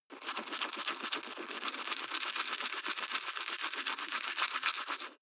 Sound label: teeth brush wet closed front